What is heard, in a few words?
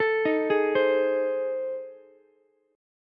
A Minor Major 7th Flat 5th A, Ukulele; AmM7b5 arpeggiated; 14,15,16,15